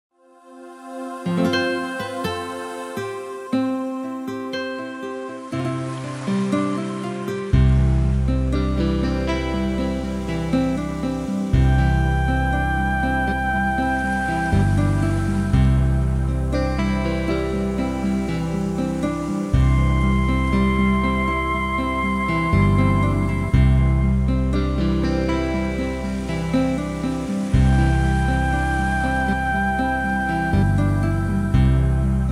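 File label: Country Ballad; ACE; Clever-1; alarms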